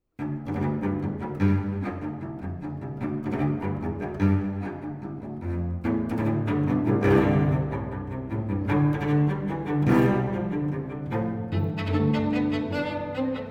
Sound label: Sonata for Violin and Cello; Contemporary Classical; Vif, avec entrain